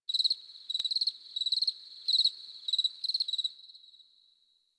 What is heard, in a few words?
Insect Night